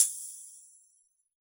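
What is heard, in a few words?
hip hop kit tambourine reverb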